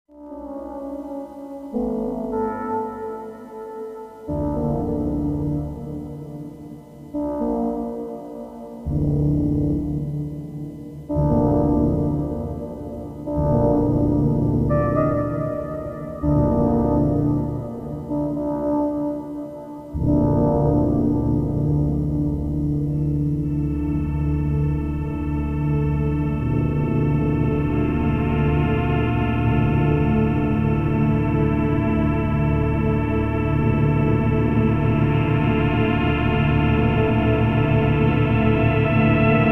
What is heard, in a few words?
dx7 pad; A DX7 layered with a TX7 (a DX7 module) some phasing effects; Yamaha DX7